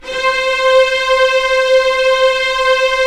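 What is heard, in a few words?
string section; Roland - LA Composer vol. 1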